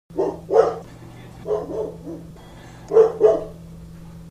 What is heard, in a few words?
Barking